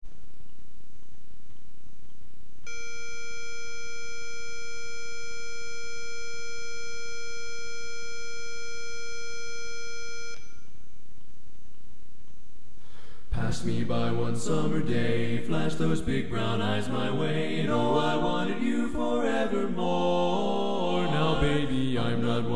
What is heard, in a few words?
Bass